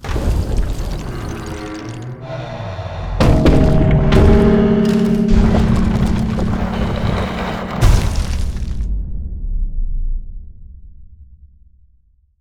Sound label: bone big gate open sequence